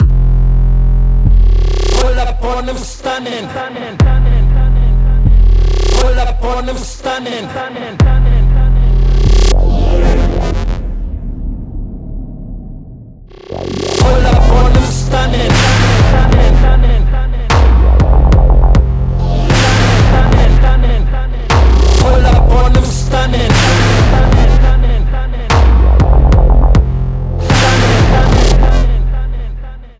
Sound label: Automated Acoustics